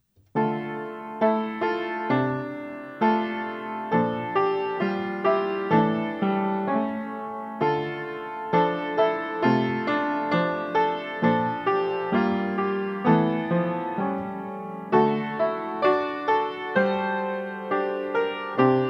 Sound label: hymn; Out of the Depths